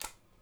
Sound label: click